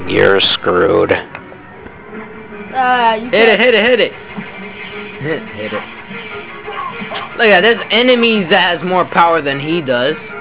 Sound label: Fire Fight